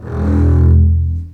String Collection, MARCATO

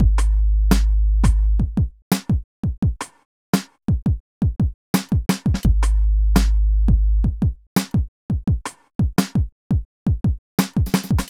Beat, Hip-Hop

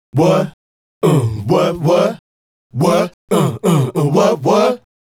Male Group, Vocal

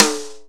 BRT SNARE; Greytsounds VOL-1; SDX SNARES

